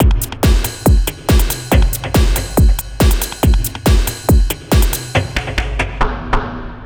hard beats 140, hard trance beats

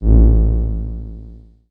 KEY SHOT TEKNO 140 A; Techno2; Singleshots

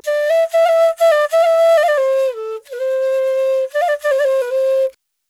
ETHNO EXTRA 90; ethno2

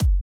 Kick

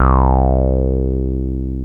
TB BASS; TB-303

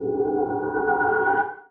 SPACE FX 140; SpaceSounds

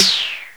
SNARE 6; KillerTweaksII; DirectWave

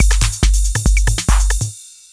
TECHNO BEATS 140

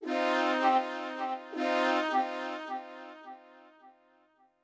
flute, ethno, 160, C

